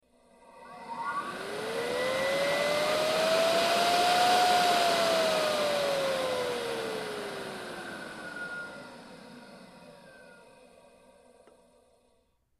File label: Electric Turbine Blower; Whine, Quick On, High Speed Run, Rev Up / Down; power on off